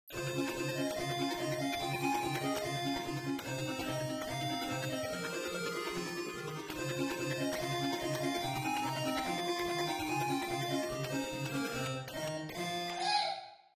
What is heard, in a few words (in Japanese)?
ピコピコ